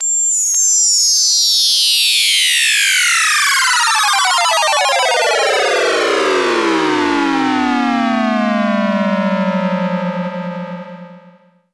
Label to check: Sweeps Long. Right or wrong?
Right